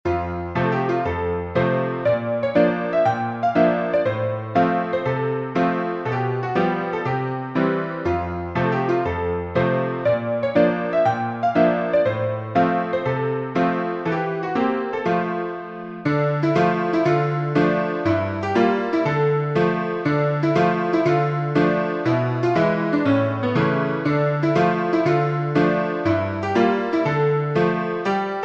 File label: piano, sheet music